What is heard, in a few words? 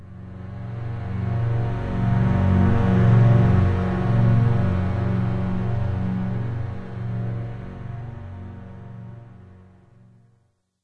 swell